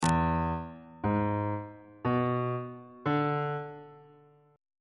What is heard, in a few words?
c sharp phrygian 7th chord 3 is e dominant 7th on bass clef